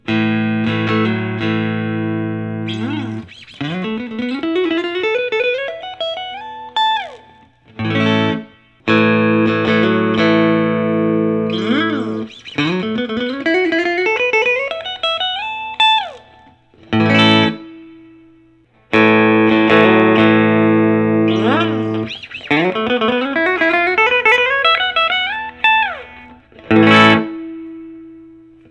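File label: lentz traditional t lake placid blue, guitars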